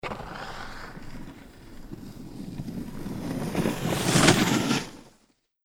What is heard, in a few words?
snowboarding